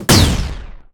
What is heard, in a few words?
impact mech; Hits, Impacts